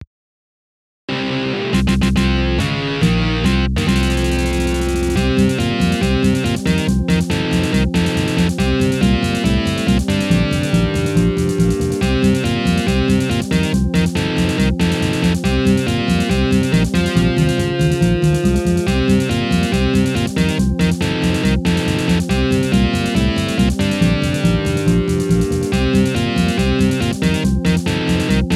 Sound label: Victory Fanfare; final fantasy v